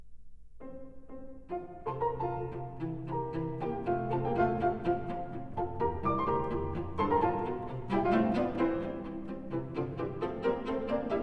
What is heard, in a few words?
Variations for cello & piano in F major